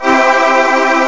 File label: CHOIR